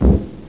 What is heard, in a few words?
flak